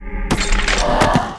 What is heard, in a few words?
Mob die; Monster; 욱일승천류; 요사무라이